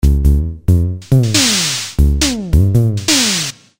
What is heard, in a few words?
Loop 138bpm, Drums; leloops